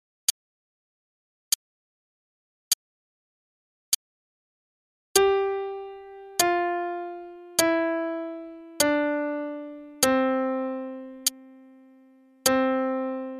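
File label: Starlit Melody, piano; Faber Adult All-in-One Book 1; qn=50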